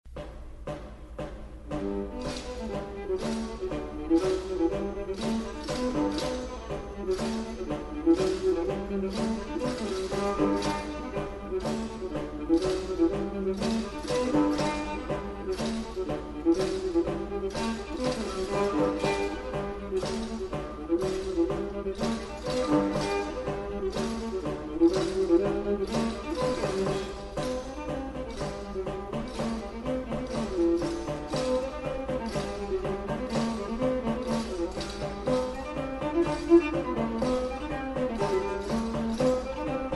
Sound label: English Dance